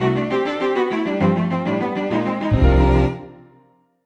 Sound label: Jingle Opening Western; clubhouse games 51 worldwide classics 2020 switch gamerip; Salon